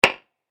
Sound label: A Single Hand Slap Hit; Slap Sound Effects; human sound effect; Andrew Fleming